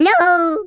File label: Game Over; bball